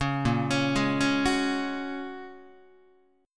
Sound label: Bb Minor 7th Sharp 5th, Guitar